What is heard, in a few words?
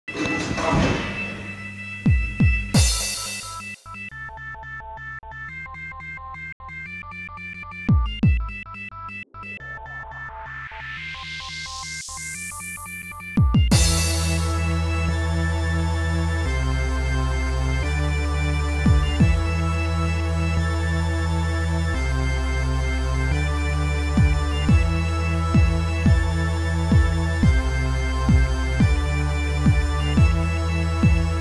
fast music